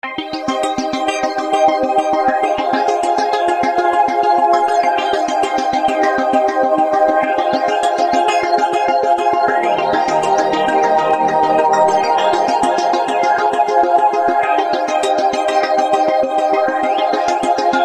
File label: ringtone